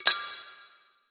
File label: Champagne Clink